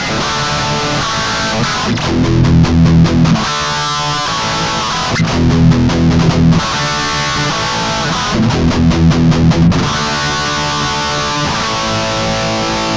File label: Guitar Licks